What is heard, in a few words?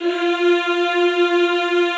Classical Choir, F3; Best Service; Extended Classical Choir